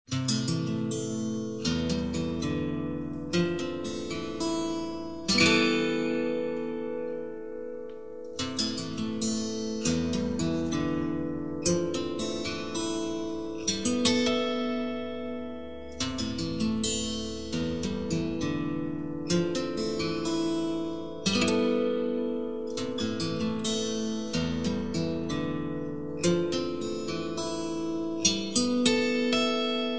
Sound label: acoustic